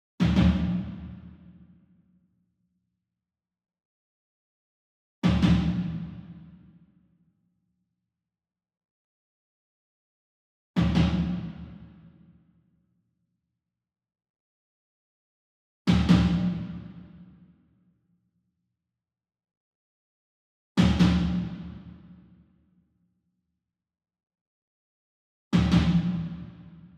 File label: SINGLE DRUM Tom Concert Double Soft Beater Far; boom library; Cinematic Strikes Construction Kit